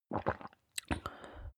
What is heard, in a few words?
drink swallow exhale